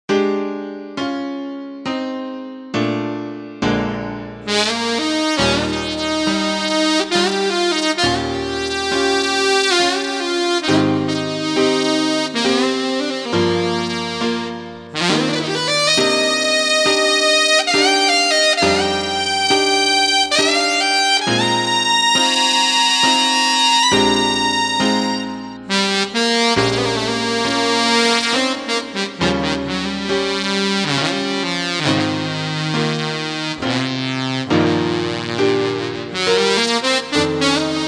Harp Alt

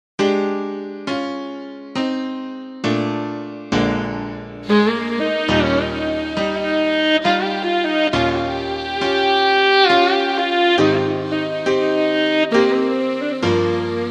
Soft Reed

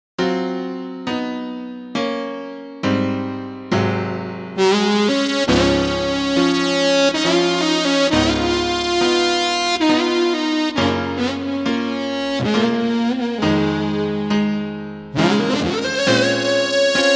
Mild Tnr

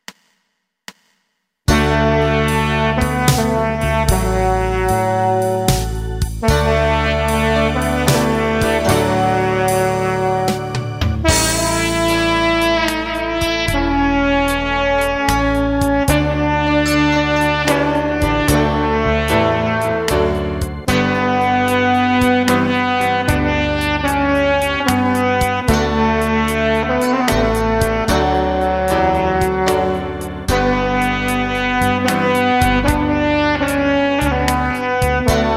Silent Melo Bone

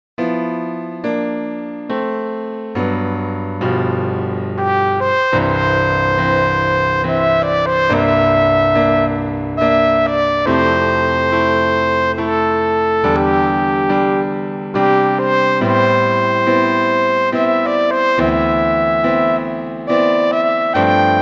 Amazing Brass Flugel

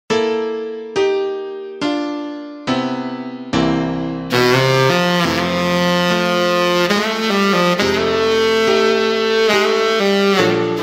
Brt Tnr

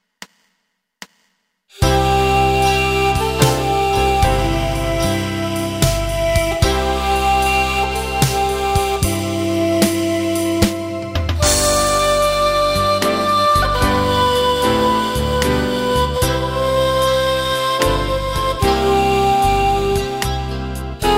Silent Wisper Vox

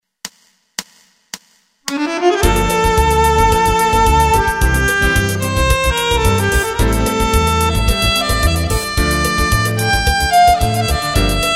Violin; Nu Violin

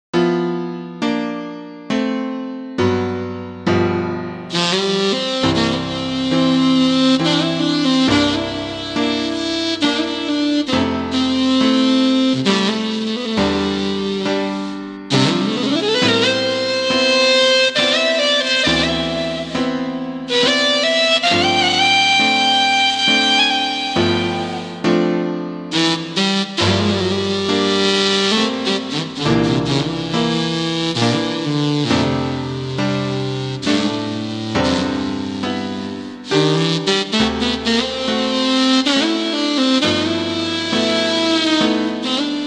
Tenor Sub

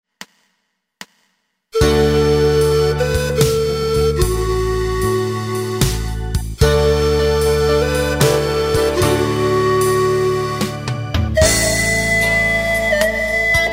Silent Andean

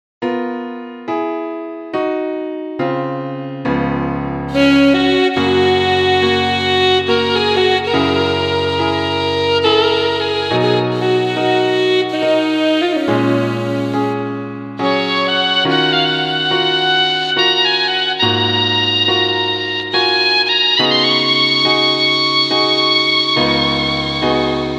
Soft Reed; Amazing Grace

